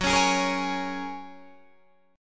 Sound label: Gb Major Add 9th, down, Guitar, Open D